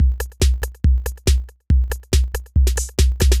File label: techno 140